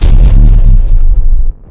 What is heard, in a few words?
Impact